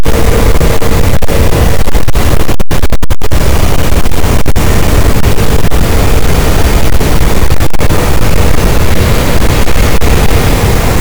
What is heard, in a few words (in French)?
cachalot, boat; Physeter Macrocephalus